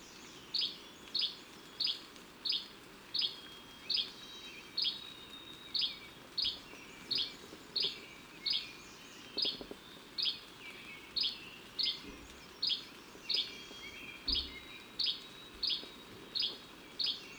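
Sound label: Yellow-bellied Flycatcher Fast call; Bay DEspoir 2002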